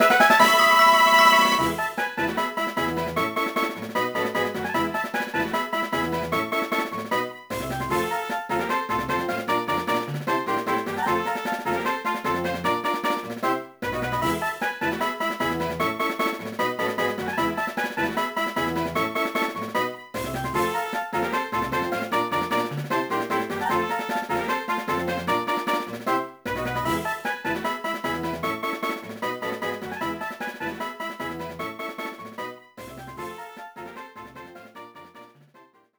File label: Victory!; Pokémon HeartGold & Pokémon SoulSilver Greatest Sounds